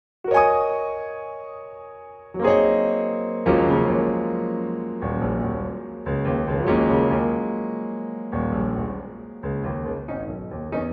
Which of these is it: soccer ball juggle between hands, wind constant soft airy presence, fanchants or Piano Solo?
Piano Solo